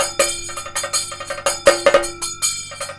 KATAK, Indian Traditions

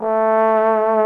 VIBRATO TROM

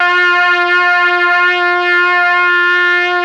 BRASS; ILIO; Propeller Island Legendary M400

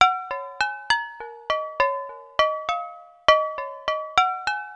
Struck Glass Melody

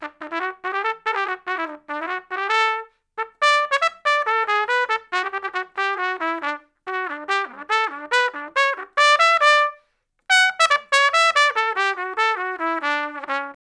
Trumpet Solo, Jazz Traditional

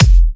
Dubstep Kicks; Bassdrums; Vengeance - Essential Clubsounds Vol.5

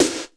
hard drums; 90 sSampleCDs; Crypton - DrumBase5000

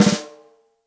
ROCK SNARE CHROMATIC, Real Drum Kits

